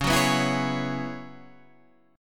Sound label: F sharp 7th Suspended 2nd Suspended 4th, C sharp